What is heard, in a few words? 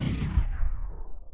paddle; rapanui